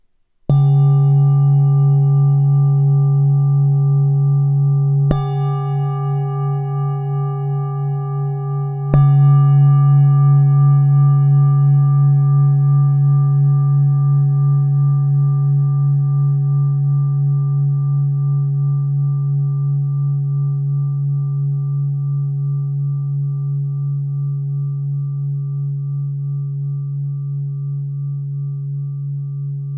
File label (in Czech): tibetska misa